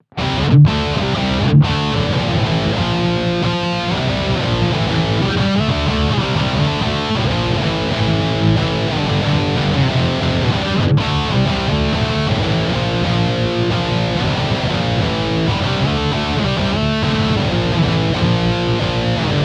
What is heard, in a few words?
High Gain